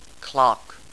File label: clock; Overclocking